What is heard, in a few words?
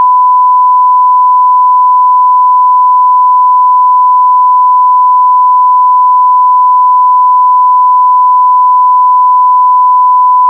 1kHz